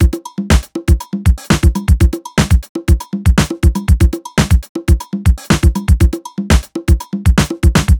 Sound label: 120 hard basic, Nu Jazz House Beats; PowerFX